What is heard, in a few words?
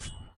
Climb Ladder Loosen Grip